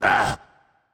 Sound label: surprised; smite; zeus